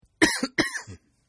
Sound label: Cough Male; Sickness Sounds; Sound Effects:Human; Partners In Rhyme